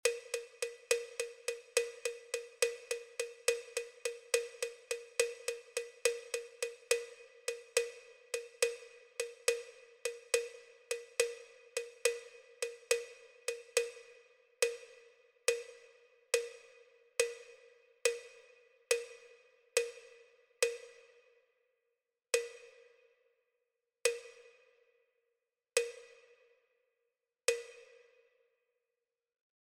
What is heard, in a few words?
Tempo Test Triplets 70bpm